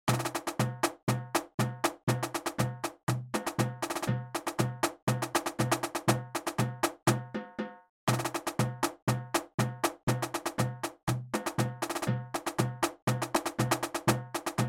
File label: marching drums